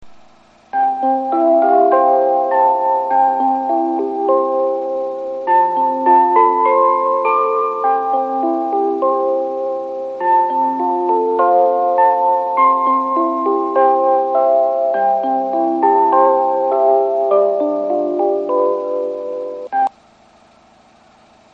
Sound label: kuki 2 bannsenn